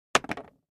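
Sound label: Mech Metal Object Move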